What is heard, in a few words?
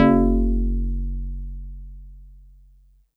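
StarPiano DX100 F#2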